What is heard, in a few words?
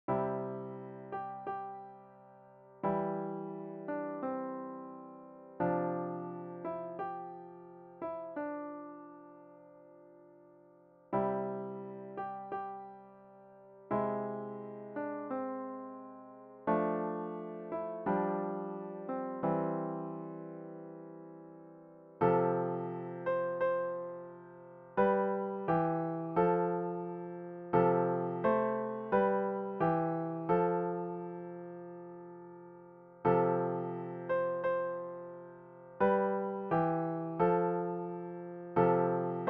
new world symphony piano solo